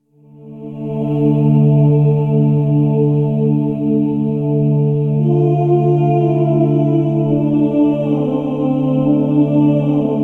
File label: Church Start; Prison Architect